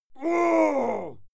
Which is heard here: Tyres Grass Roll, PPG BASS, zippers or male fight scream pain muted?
male fight scream pain muted